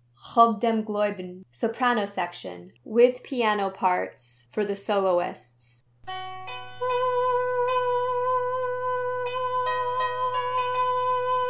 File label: soprano w piano; With piano for soloist parts; khob dem gloybn